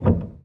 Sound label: piano release